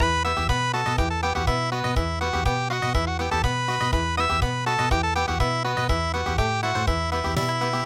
country; MANSIKKA

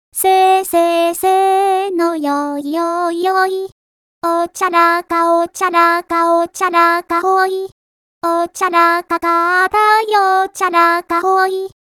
vocal only; midi traditional vocal; ocharaka

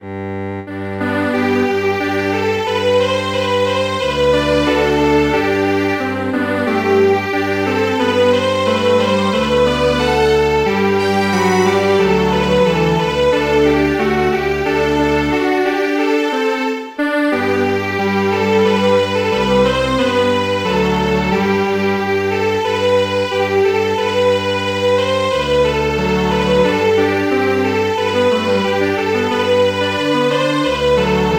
Strings Quartet; The dance of barley

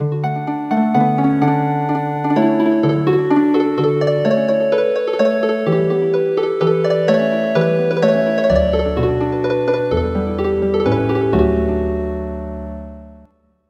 Healin Harp

